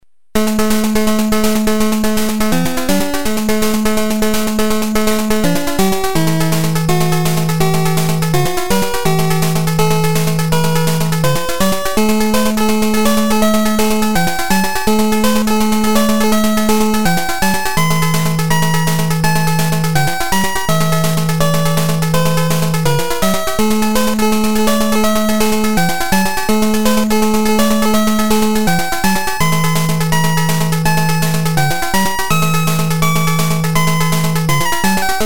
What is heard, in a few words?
Corridor Music